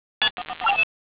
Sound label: beep; xfce